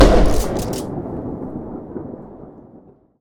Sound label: fire, soldier; minitrem